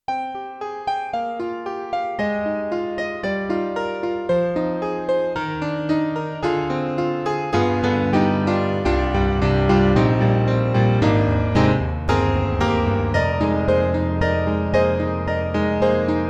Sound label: Alesis NanoPiano Acoustic Piano Velo Piano